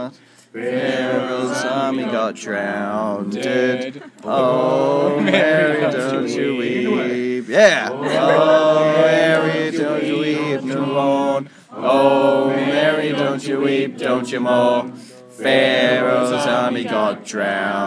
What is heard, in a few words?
group singing